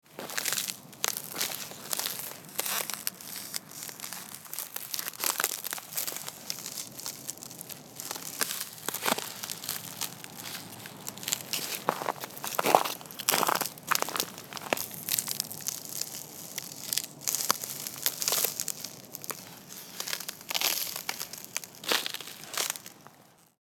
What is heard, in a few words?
Walking on frozen ice shoes crunchy cracks